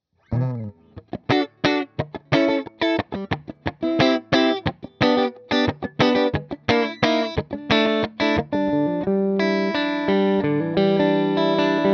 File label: Flange